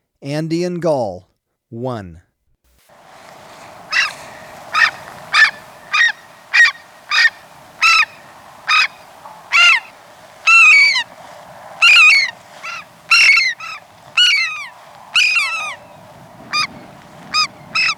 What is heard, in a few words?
Andean Gull; S. America